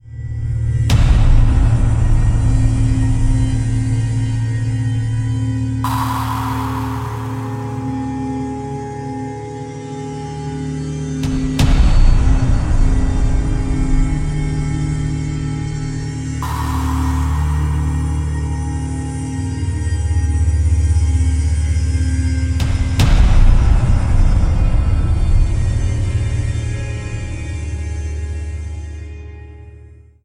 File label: suspense; svencoop; echoes